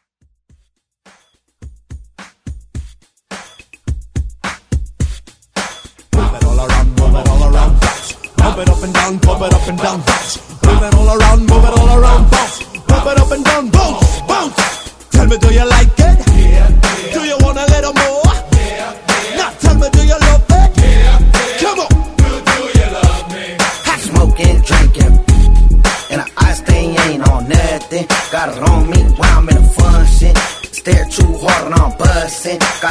Hype Redrum

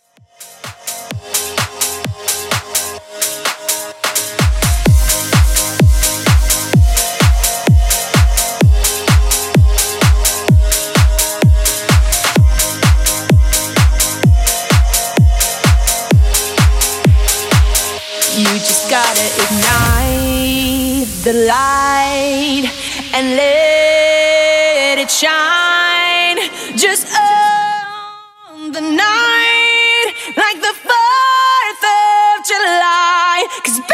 Top40 Epic Intro 128bpm

